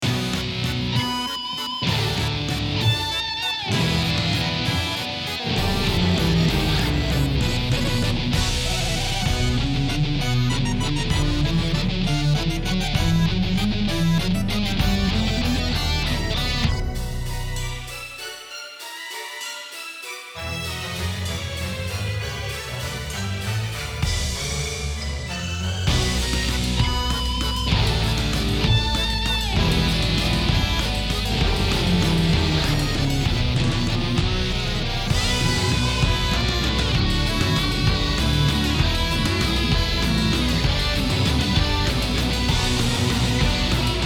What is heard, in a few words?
Tank Waltz; Battle City